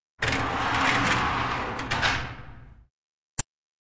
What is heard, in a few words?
Track, Doors; 헐리웃특수효과음; 문 소리